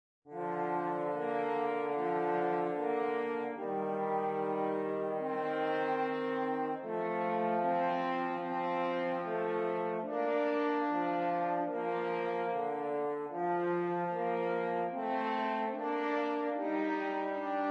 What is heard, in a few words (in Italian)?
duetti per corno; 20 duetti facili per corno